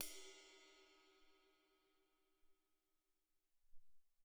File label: Ride Cym, Alesis SR-18